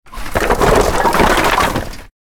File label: objects, World of Tanks